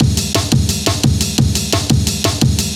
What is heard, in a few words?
DNB BREAK; m8 breaks